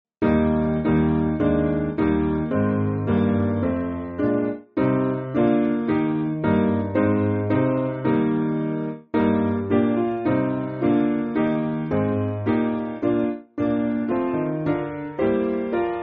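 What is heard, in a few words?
Piano, Music Backing Files for Churches; Hallelujah Sing To Jesus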